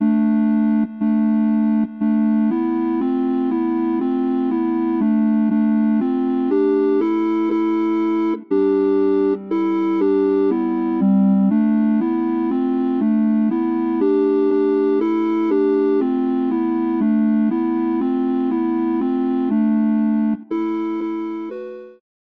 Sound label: smooth drawbar organ